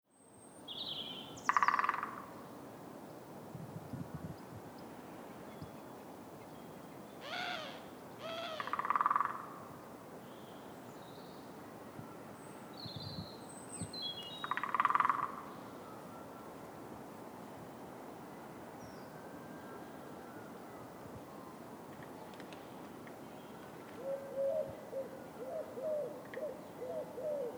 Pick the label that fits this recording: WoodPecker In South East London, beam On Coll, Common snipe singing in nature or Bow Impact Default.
WoodPecker In South East London